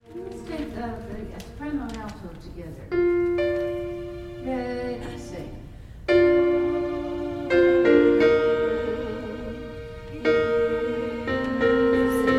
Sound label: soprano and alto